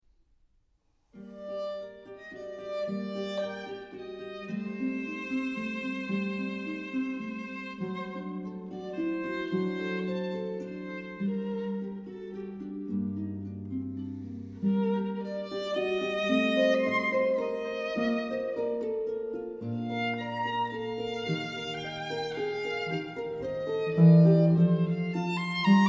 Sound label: Sonata for Flute and Keyboard; Siciliana; J.S Bach; 15.Siciliana; Sonata in E-flat major, BWV 1031 for Flute and Harpsichord